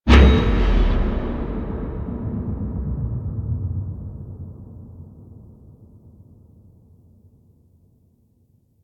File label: Activation Stinger; Entrati Labs; Grimoire For Gargoyle